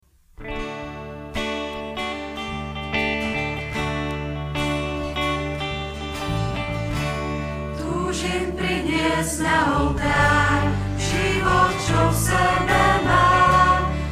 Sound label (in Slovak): Nedelna omsa - Tuzim priniest na oltar